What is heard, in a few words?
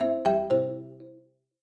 incoming; twiliojs